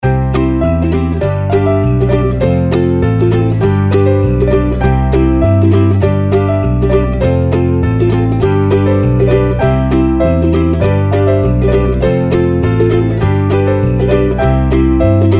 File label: ukulele and sleigh bells full, Uplifting